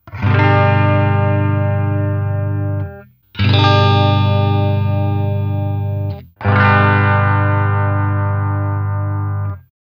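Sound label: Egtr pickups, electric guitar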